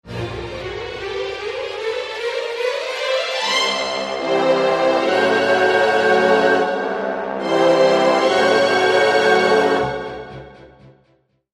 Music Logo Orchestral Up

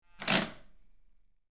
High Pitched Drill, air tool

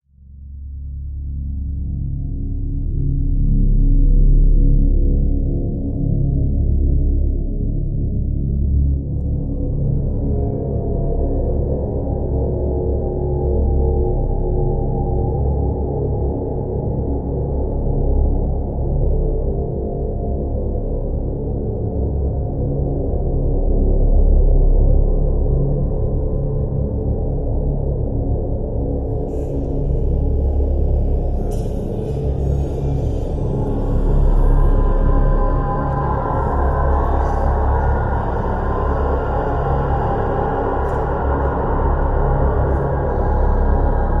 Reanimation Strt Low Pi, deep

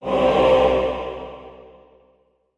Music Effect Choir, choirs

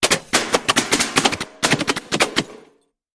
Bullet Impacts Metal

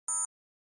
Flash Beep Elect Tone

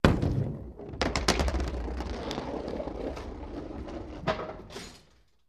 Bowling Gutter Ball

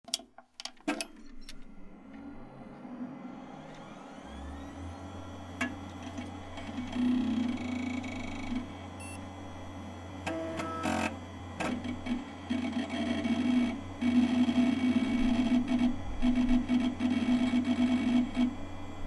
Pc Startup Hard Drive, hard drive whir

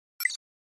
Flash Gear HF Elect Ch, flash gear

